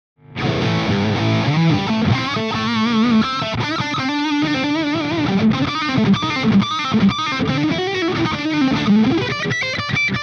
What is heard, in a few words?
HIGH plus BRIGHT; GRAVITY SPOT